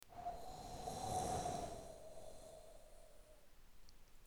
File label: Wind; Assemblee SFX